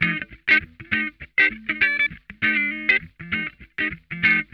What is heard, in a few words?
MUNCH, Funk Guitar; Zero G - Funk Guitar